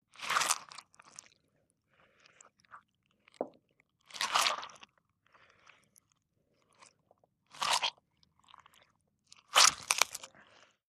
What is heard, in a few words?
Tearing Opn Melon Squ